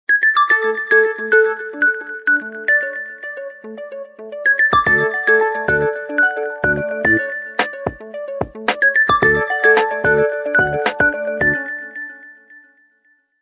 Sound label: midi ring; LG VX9200 Env3